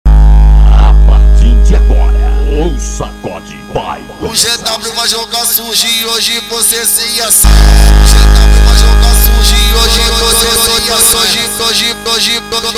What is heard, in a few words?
Phonk Montagem